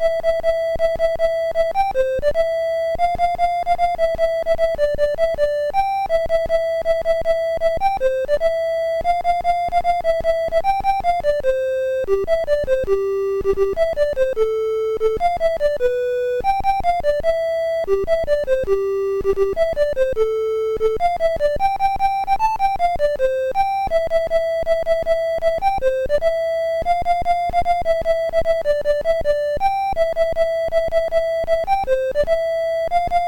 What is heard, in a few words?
Jingle Bells, MOS6581R2